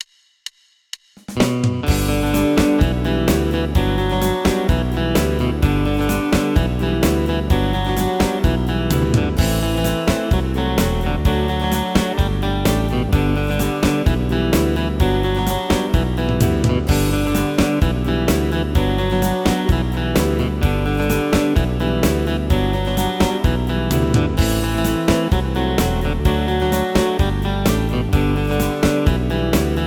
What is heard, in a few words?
PSR2000 VintageOpen